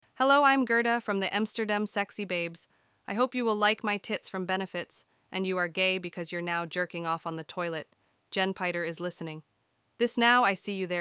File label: fart